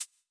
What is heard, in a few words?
tile